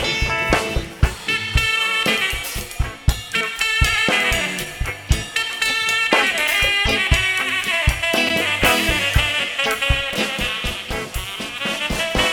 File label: Resampling Sax Solo Behringer ADA8200